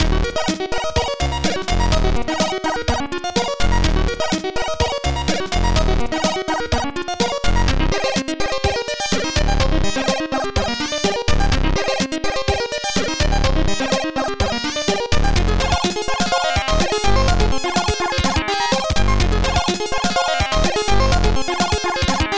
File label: keygen song